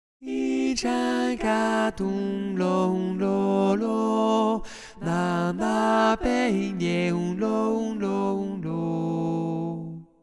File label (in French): alto